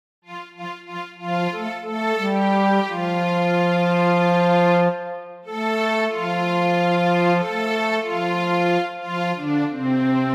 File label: Swing Low Sweet Chariot Karaoke